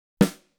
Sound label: snare